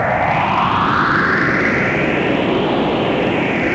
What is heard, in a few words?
Whoosh; Working Model Program